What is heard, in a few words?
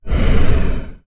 Swoosh Transition